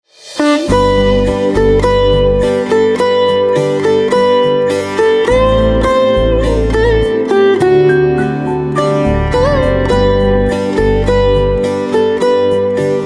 Veena, instrumental

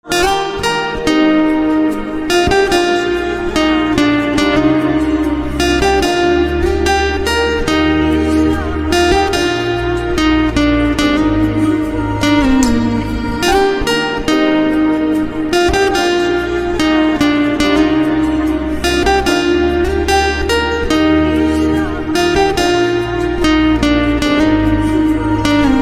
Guitar

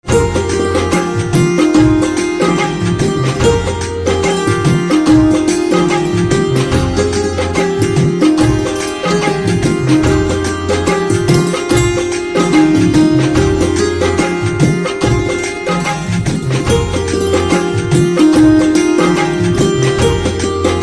Santoor

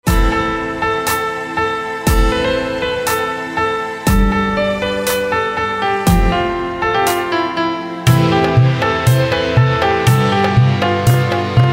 Piano Instrumental Ringtone

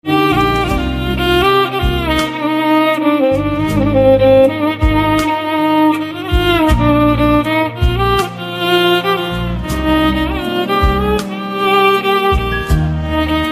Violin

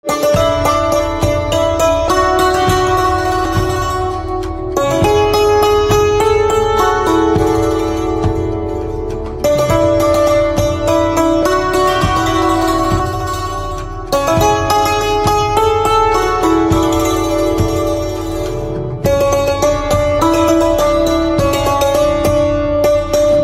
Santoor, instrumental ringtones

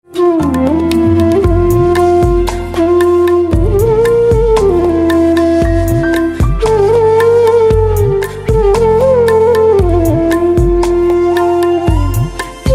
Flute

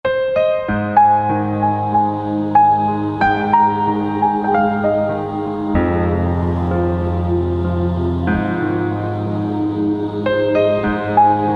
Piano Silent, instrumental ringtones

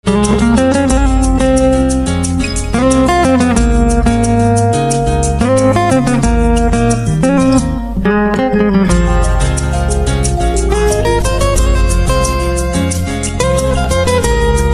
Guitar

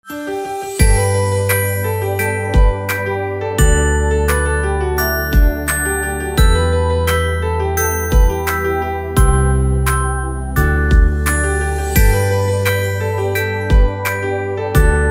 Piano

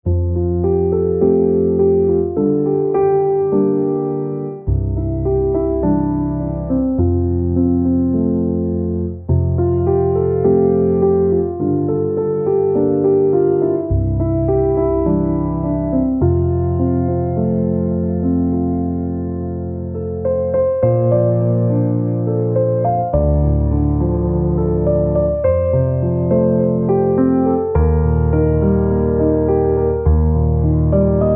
Piano Silent

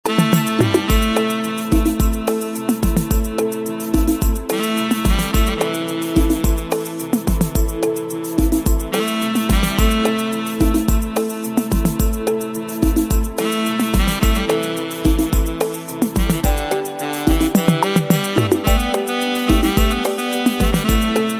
Saxophone Instrumental Ringtone

